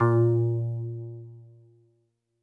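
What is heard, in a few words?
Jazz Harp Kawaii A#4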